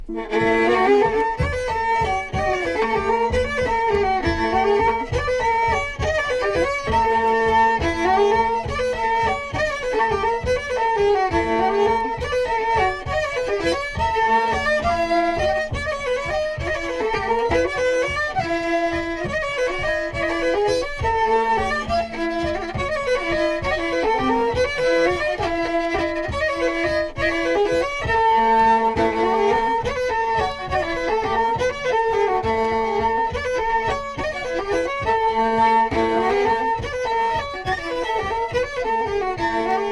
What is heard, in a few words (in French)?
Bourrée; Chabrier